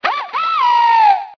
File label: Cry; Pokemon Essentials; Pokemon Circon BETA 2 v1.8